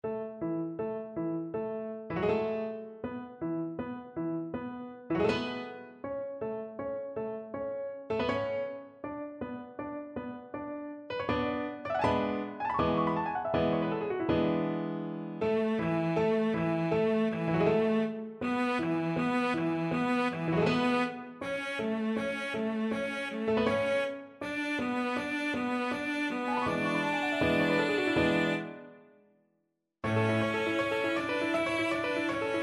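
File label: violoncello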